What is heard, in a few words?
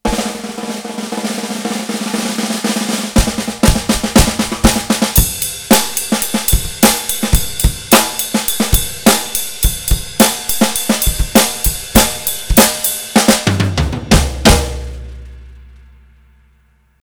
6.5x14 trans snare; CraftDrums